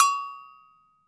AGOGO BELL; AKAI S-Series CD-ROM Sound Library VOL-5

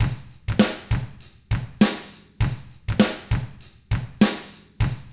ROCK, Drums; ILIO; Double Platinum Drums 1

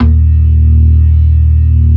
ORGAN, C3 PERC; Keith Emerson Signature Series

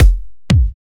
KICKS

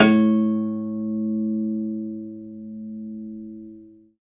Rubbery Piano, prepared piano; A0; Total Piano

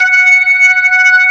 B3 Lo Slow F#4; Proteus 2000; Digital Sound Factory Proteus 2000

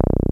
303 MUTE, BASSES; Pro-Rec - Synthsonic

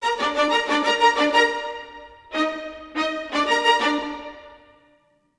90 g min strings hi; PowerFX - Downtown Orchestra; Orchestral arrangements

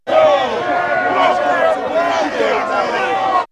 Fear Mob, vox; vox mix; X-Static Goldmine

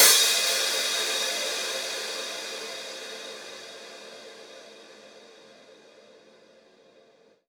Crash, Cymbals

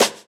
Snare, jungle breaks drum sounds

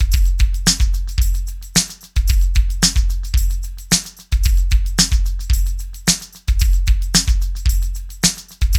DEEP, LOOPS; Best Service; Hyper Dance

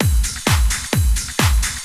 drum 130 techno; Techno Trance Essentials

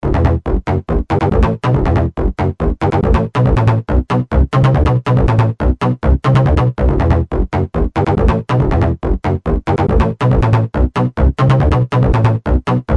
Euro Bass, Industrial

